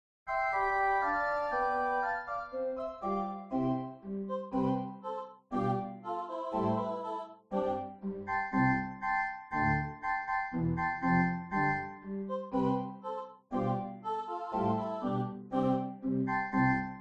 piano organ; A Mighty Fortress

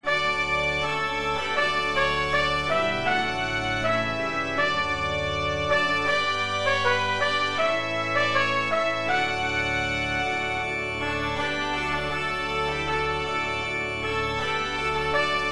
trumpet desc; Joy To The World

